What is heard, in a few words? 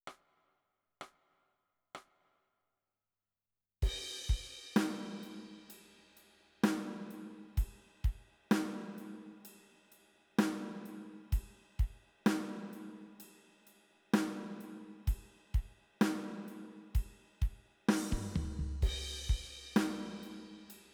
Drums